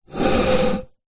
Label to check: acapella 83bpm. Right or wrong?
Wrong